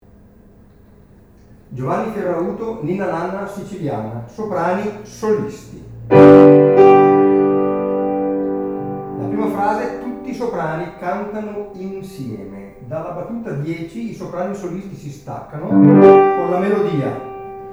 Ninna Nanna Soprani Solisti

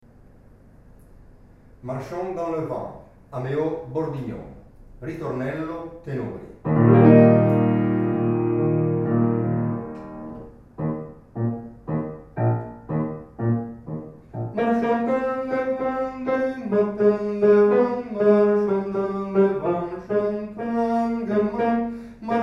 Tenori; Marchons; Ritornello

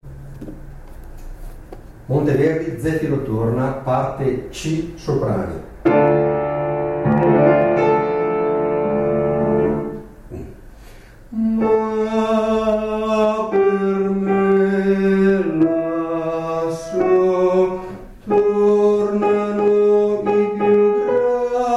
soprani; Zefiro torna C

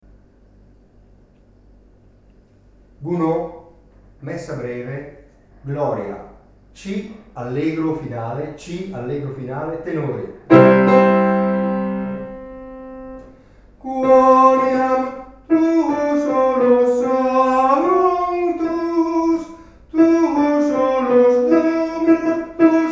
Gloria allegro finale Tenori; Messa Breve Gounod

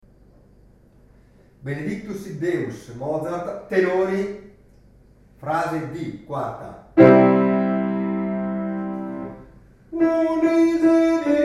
Tenori; Benedictus Mozart

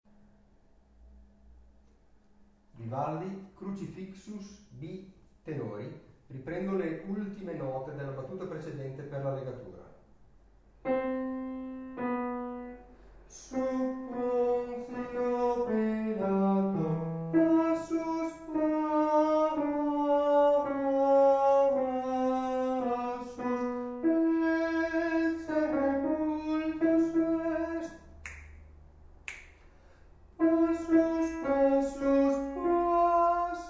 tenori; Credo Vivaldi; Crucifixus

